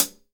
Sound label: K-ZIL HH